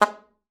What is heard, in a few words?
alto sax G#3; Xsample VOL-2